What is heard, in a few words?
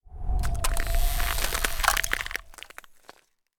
Freeze